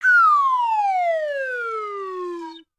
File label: Rocket Fall Down; cinematics